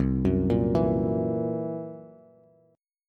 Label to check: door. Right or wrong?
Wrong